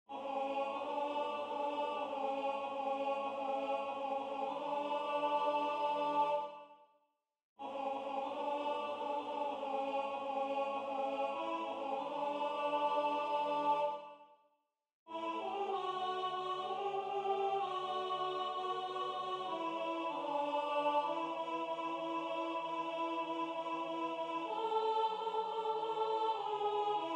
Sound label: alto; Waiheke Island Choral Society